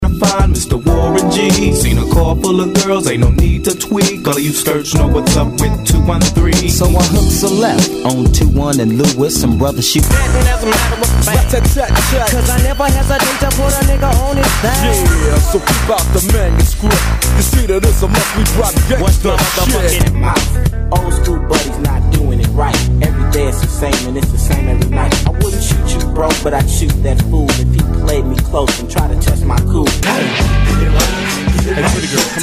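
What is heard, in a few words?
Old School Hip Hop Megamix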